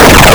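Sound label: Explo, explosion; CocosDragonJS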